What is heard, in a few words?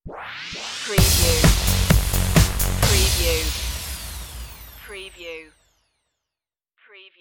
twilight stinger